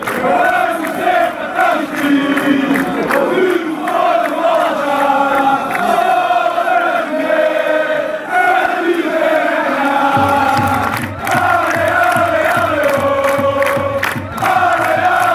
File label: allez allez allez oh, fan chants